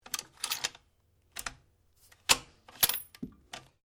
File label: RIFLE REMMINGTON700 7.62MM LOADING SEQUENCE; A selection of domesttic firearms; Rifles; Guns